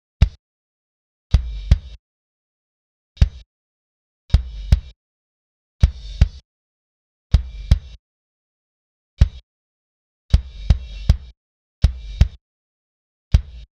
Kick Modern

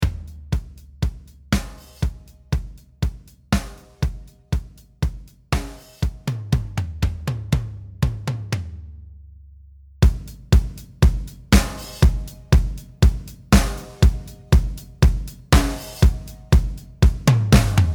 drs no eq preamp 73

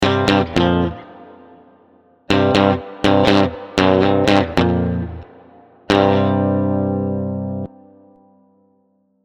riff pur; IK Multimedia AmpliTube Orange

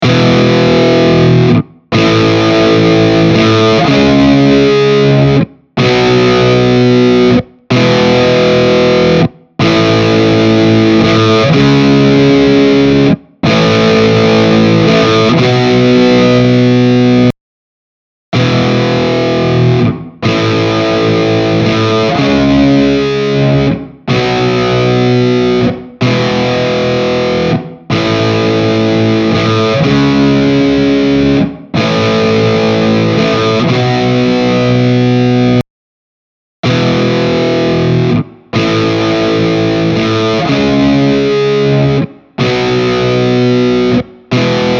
Montage Power Chords

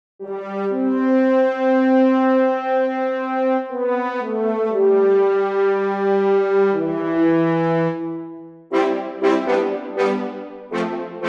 Brass Mid

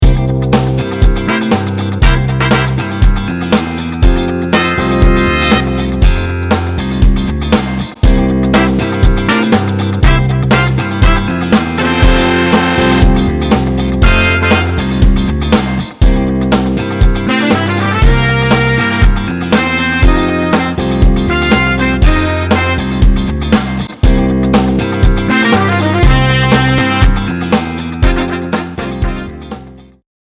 TAPE default

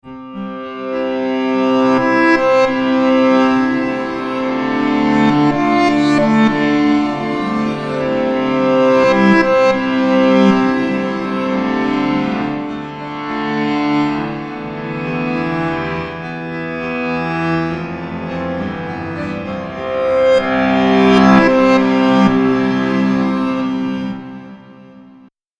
slow strings closed

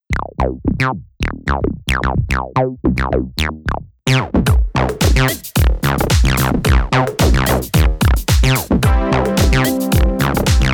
TB-303 Song

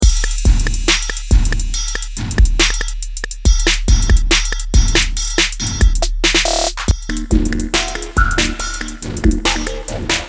Perc Step FX Trap Droid; Logic

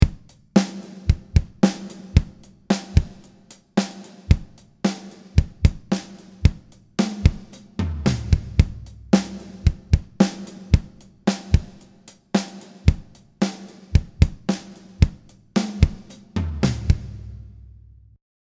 kit uncompressed

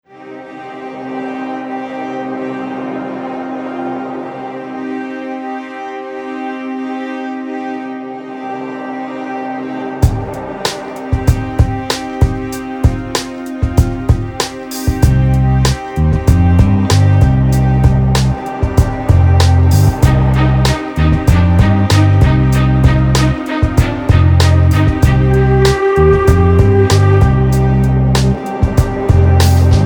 swarm groove

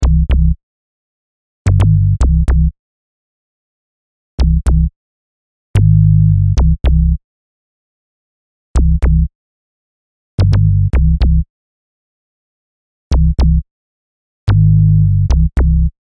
sub boom bass

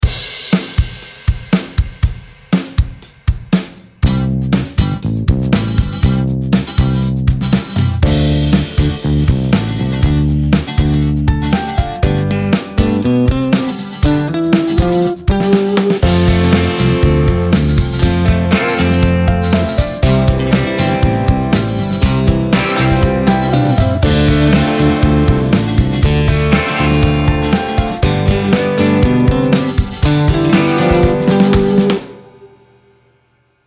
rock

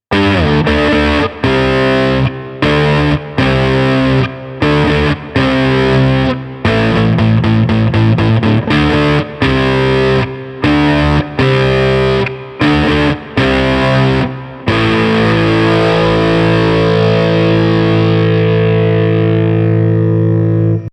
heavy custom Twin

